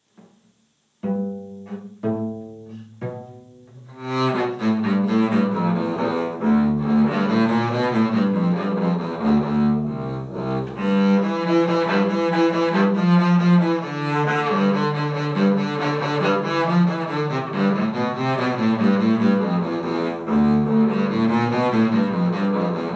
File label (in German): Kontrabass Polka; Klangproben Instrumente